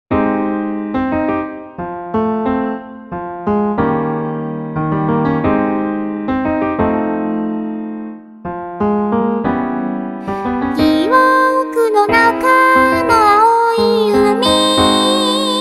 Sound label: Piano Ver.